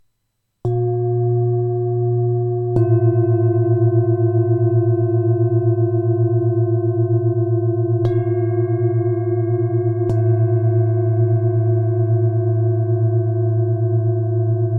binauralni zdobene tibetske misky

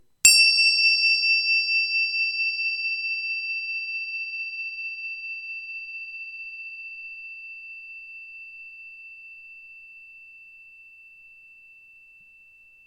tingsa tibetske cinelky; s mantrou om mani padme hum; 6cm

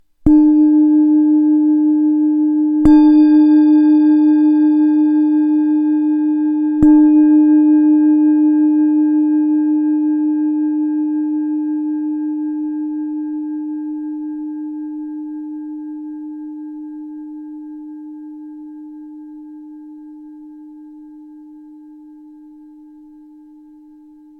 matna tibetska misa

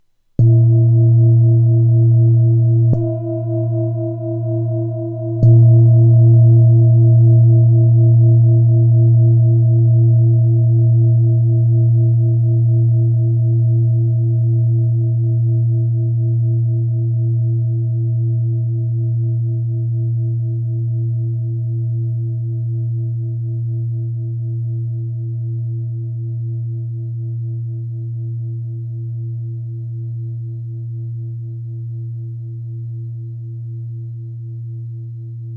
tibetska misa; leskla; 23cm

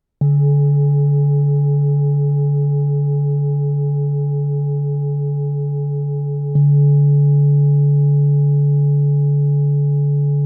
tibetska misa; cerna; 25.5cm

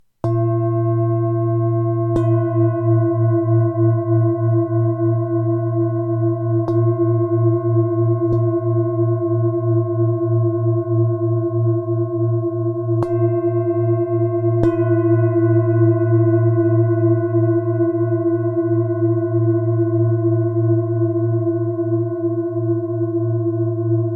binauralni zdobene tibetske misky 28cm a 28,5cm

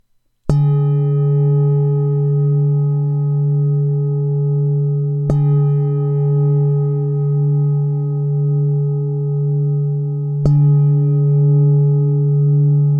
matna tibetska misa

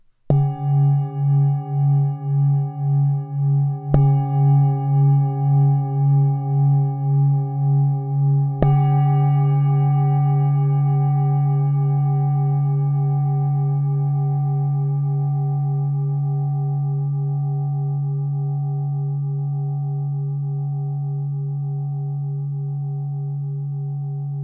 matna tibetska misa; 22cm; multi

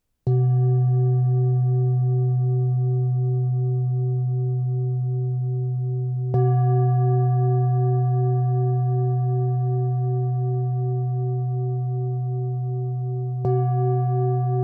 leskla tibetska misa

